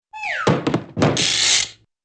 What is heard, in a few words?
trunk falls and opens